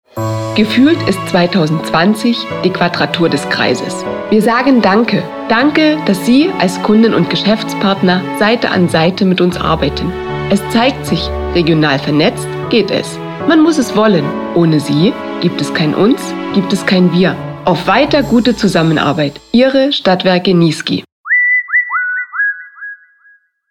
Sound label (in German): Soundlogo Danke; Stromvertrieb; SWN